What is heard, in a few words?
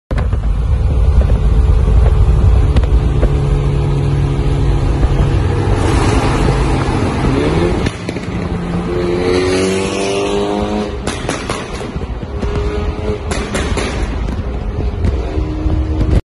Mini John Cooper; مینی جان کوپر